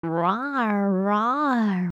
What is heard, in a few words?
rawr rawr